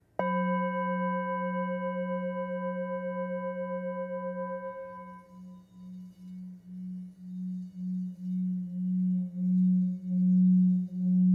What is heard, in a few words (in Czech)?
tibetska misa